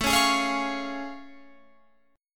A Augmented Major 7th A Guitar Open F; strummed

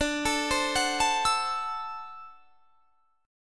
D 9th D down; arpeggiated

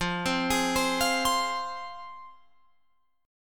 F Minor F down Guitar Open F; arpeggiated

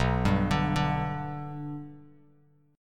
B Minor Sharp 5th B Bass Standard, chord; arpeggiated; JGuitar

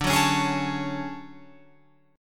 D Major Sharp 11th D Guitar Open F, chord; DM#11 strummed

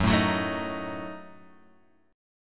Gb Minor 6th, Guitar Open G; down; chord shape

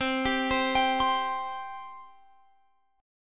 C 5th C; C5 Chord {20 22 22 X 20 20} arpeggiated

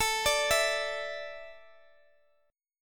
A Suspended 4th A Guitar Open D

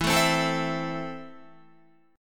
E Suspended 4th Sharp 5th, Guitar; 11,12,12,14,12,12; Esus4#5 strummed

